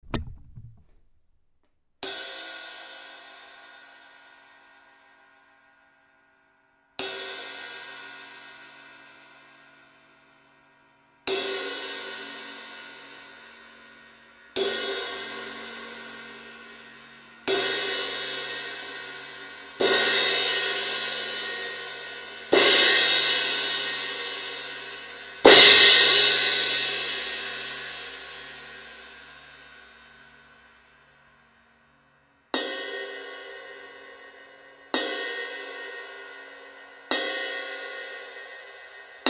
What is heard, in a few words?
Crash; Amedia; Eremya